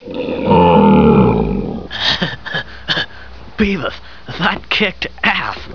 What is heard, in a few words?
shambler death; butthead